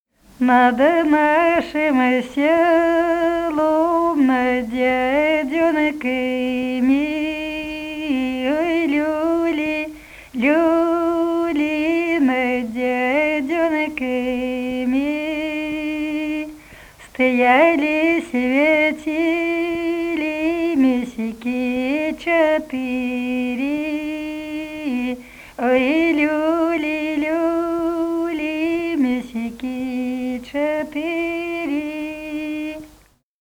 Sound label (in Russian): Над нашим селом над Дедёнками (апрельская), Музыкально—фольклорный; Музыкально—фольклорный депозитарий